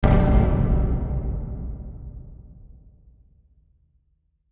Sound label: Hit Dark Low Reverb; Production Element Imaging Element Accent Transition; Blastwave